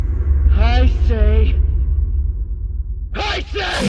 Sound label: screaming; Kaneki